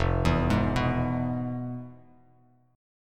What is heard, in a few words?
F Minor Major 7th Flat 5th Bass Standard; FmM7b5 arpeggiated; JGuitar